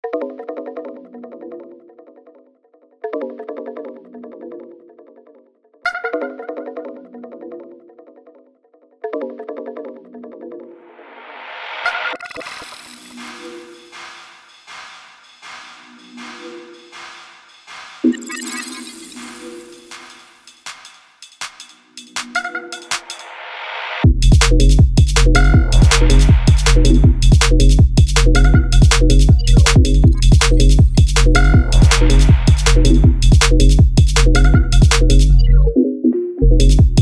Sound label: tromm tom tom tom